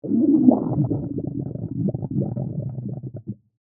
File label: Stomach Gurgle; Miscellaneous Human (Sound Effects:Human); BlastWave